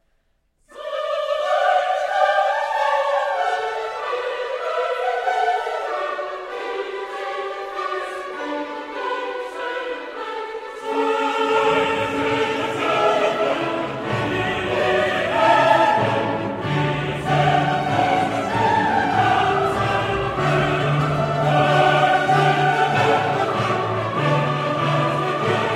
Symphony in D Minor, Choral, Allegro Energico, Sempre Ben Marcato